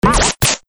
Two Punch; Best Of N2O Library

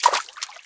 WATER, FOOTSTEPS; 1000fx

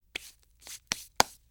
Hands Rub Wipe Dirt; Foley; Foley Essentials; Foley - Misc

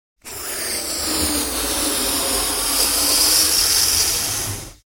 Inflating Small Balloon; Household

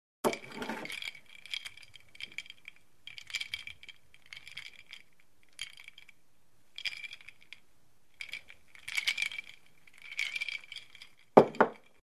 Ice Clinks In Drink; Hollywood Edge Foley Sound Library

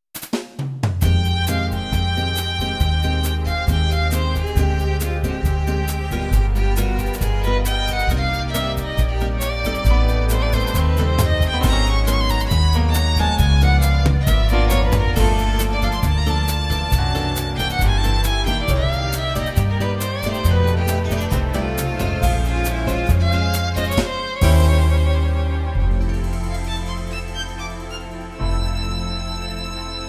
SD-90 Violin; SD-90 MIDI

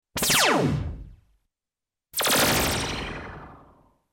POWERSHOT

